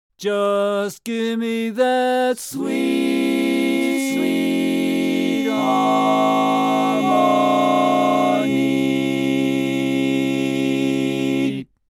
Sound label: Lead